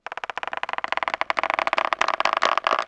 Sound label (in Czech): aerostat; Mafie 1